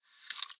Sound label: Wall Creep Hand; A Robot Named Fight